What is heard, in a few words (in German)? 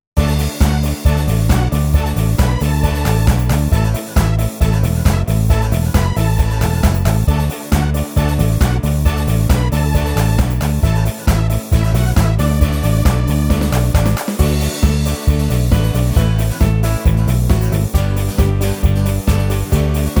Karaoke